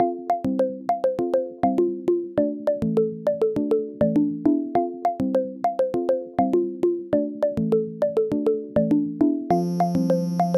Convo (Instrumental); friday night funkin vs. hecker ost pc mod gamerip

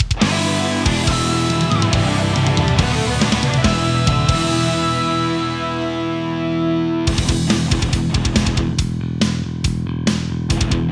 Fanfare Epic Win Start

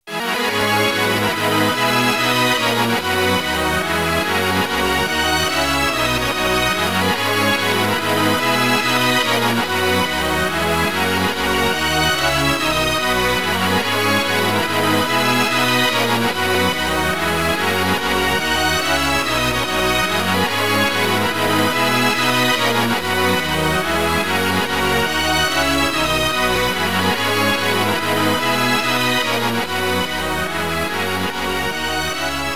Stage Clear; Dungeons & Dragons Collection; Saturn

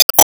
Gatling Hit; gamerip

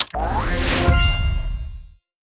Reward Ticket; Subway Surfers City; Android, iOS; Reward Campaign Ticket

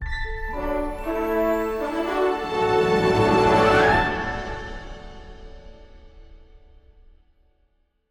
Transition

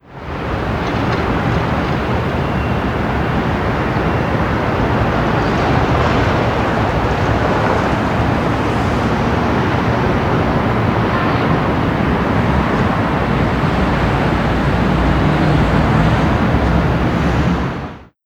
Cities Rumble

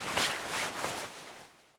Cloth

